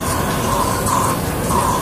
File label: Medical Pump Looped, liquid & suction